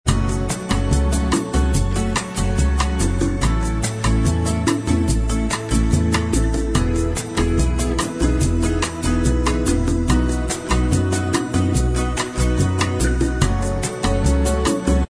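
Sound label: bossa style instrumental, backing track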